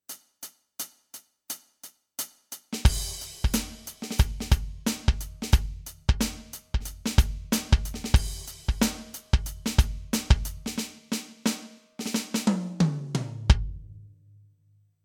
Command Kit Groove

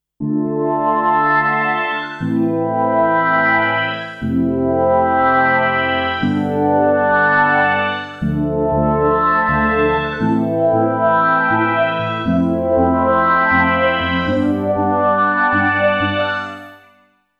Korg i3 Synth

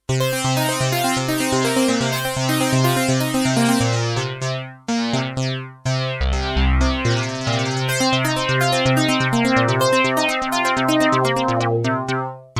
VX90 Envelope

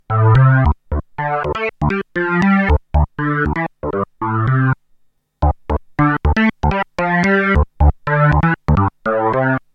Polysix Unison

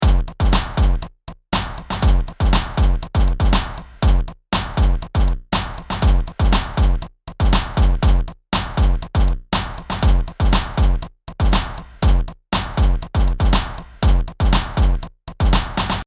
FA 06 Drum

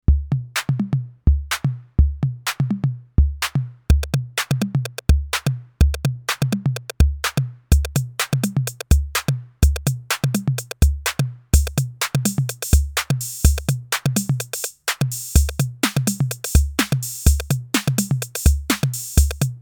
TT 606 E Beat

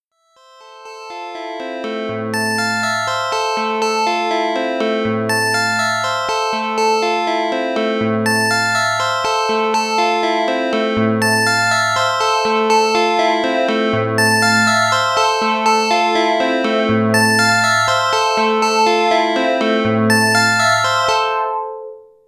Poly Arpeggio; Polysix

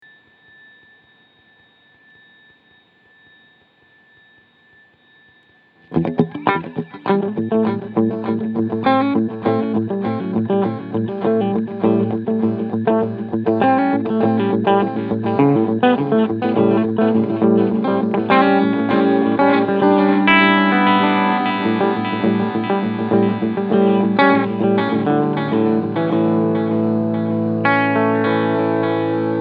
Joyo Aquarius 8 bit

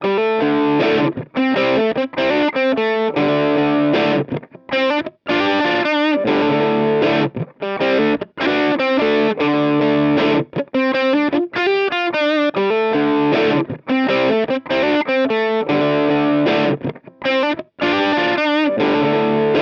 Vox AC30; Audiovergleich Mooer Nux

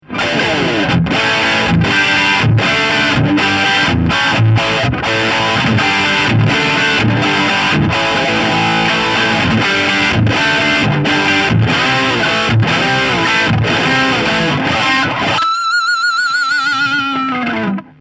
Triode Gain

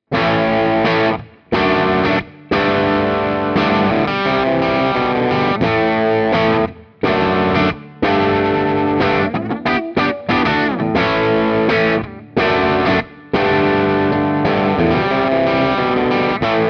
Epiphone Les Paul Bridge Crunch

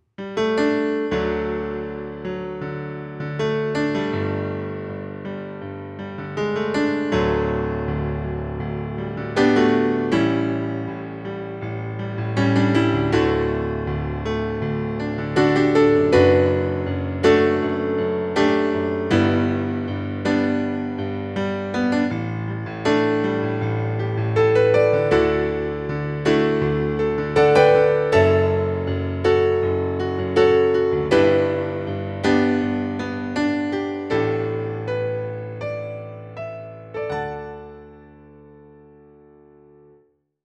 Vivo S7 Pop Grand

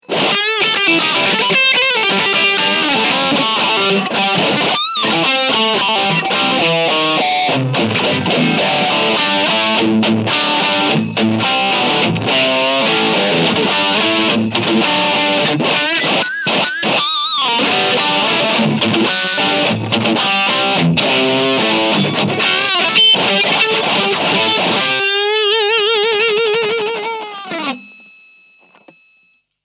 Lead

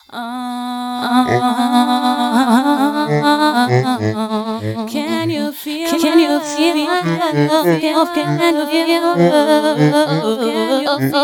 Vocal Chop; Dawesome LOVE 1.0.1

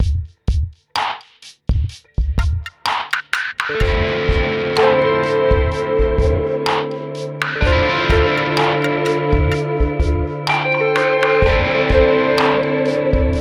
Red Panda Context V2 Hall

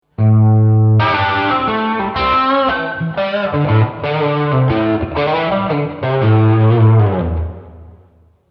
hot bluesy penta

